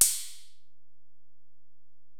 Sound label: Ride, Alesis DM-PRO